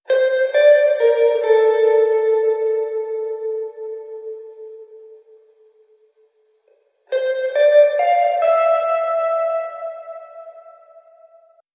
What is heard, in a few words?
guitar, Loops, Phonky